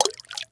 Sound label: fishing lure in water; MiniGames